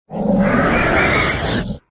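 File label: Movement Void; smite; god bakasura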